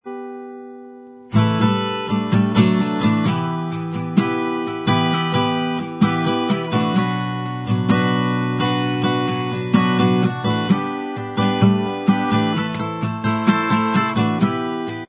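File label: acoustic instrumental track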